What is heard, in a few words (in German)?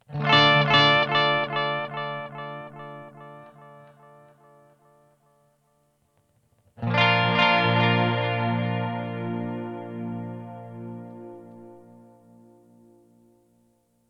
Delay mit High Cut; TC Electronic 2290 P